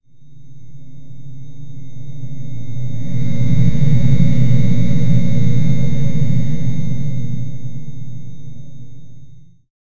the final dying sputter a; core

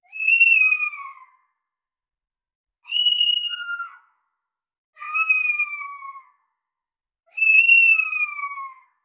glitched feline meow; cat

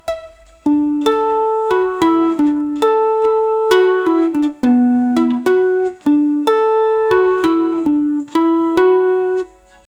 warm family background mu, acoustic

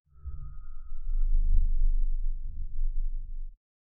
non m, mass

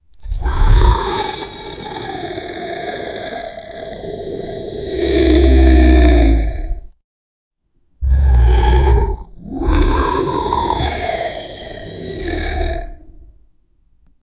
the dragon roars and then; mythical

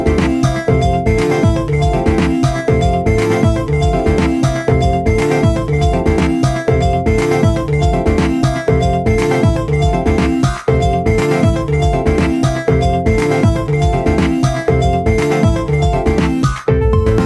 energetic upbeat and colo, chiptune

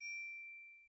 chime

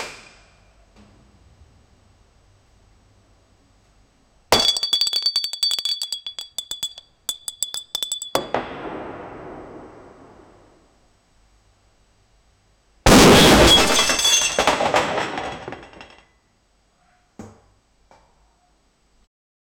a glass bowl rolling off; explosive

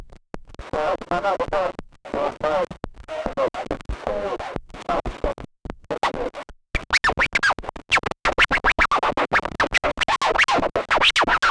scratching vinyl dj

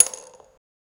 pin hit in pinball game

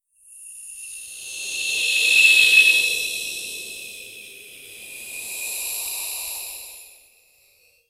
a witch hissing evil cat

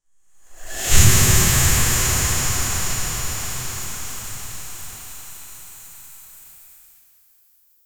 strong high pressure shhh, steam

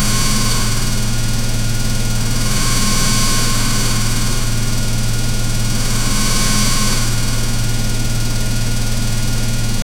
detailed industrial compr, machine

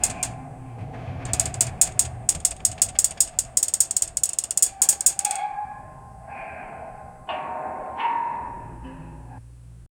soft mechanical clicks, claw

